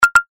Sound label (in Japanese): ココン 木琴 音低め